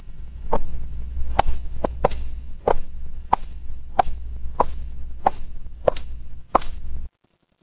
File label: footsteps heels